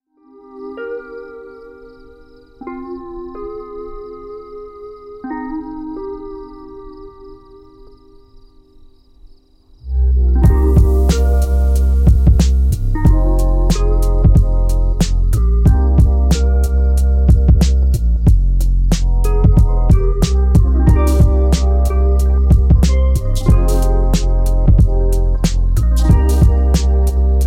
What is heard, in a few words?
Break Pause, Chill Instrumental Beats